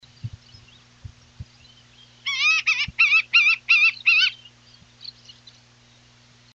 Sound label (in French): buse a queue blanche, chant